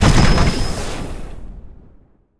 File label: Sci-Fi Explosion